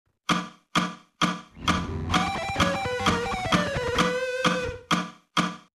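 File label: alternate picking fast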